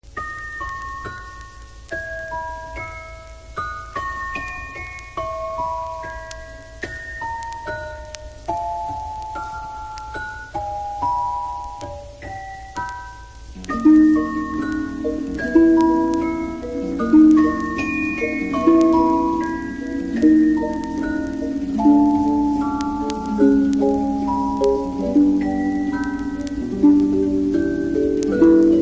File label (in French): La berceuse Percussions; Pierre Gabaye